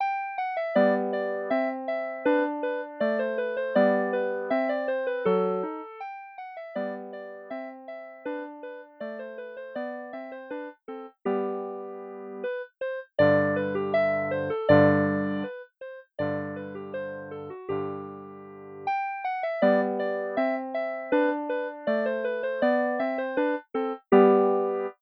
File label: gavotte